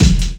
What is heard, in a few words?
00s trebly kickdrum f key, steel kick drum